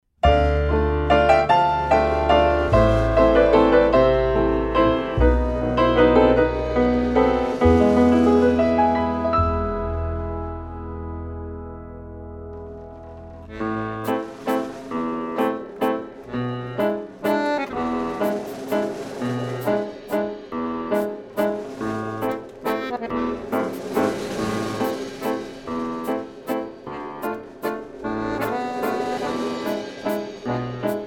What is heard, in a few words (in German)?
Karaoke; Ein Mops kam in die Kueche; kleines huhn kinderlieder